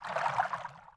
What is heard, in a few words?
Wade; Lara; Playable Tutorial Level